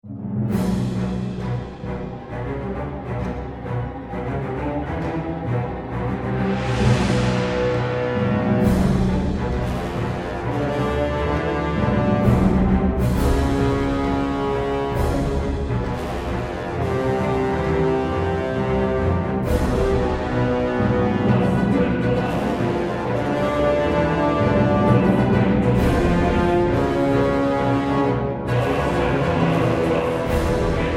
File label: bomb planted